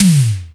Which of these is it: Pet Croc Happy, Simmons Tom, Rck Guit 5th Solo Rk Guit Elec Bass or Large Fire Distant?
Simmons Tom